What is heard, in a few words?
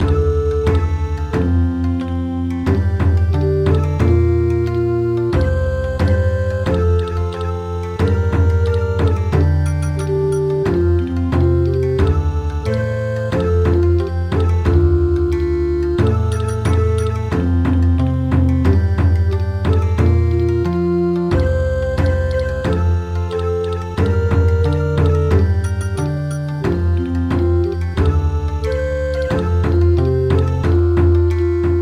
Bon Odori